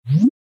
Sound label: contact open